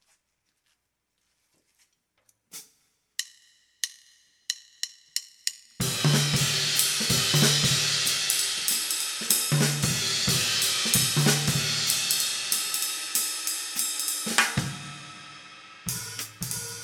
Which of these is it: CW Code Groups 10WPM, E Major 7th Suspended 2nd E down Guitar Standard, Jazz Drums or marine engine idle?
Jazz Drums